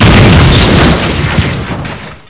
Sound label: crash; Monster